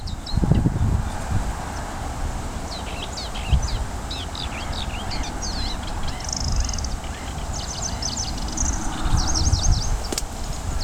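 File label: Acrocephalus agricola background Larus ridibundus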